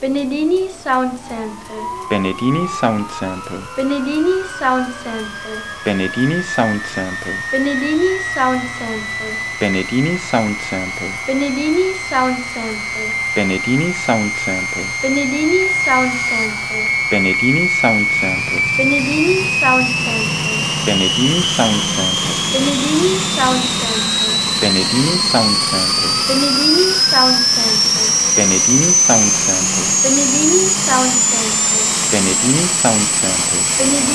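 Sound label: Bell UH1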